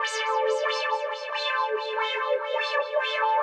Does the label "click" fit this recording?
No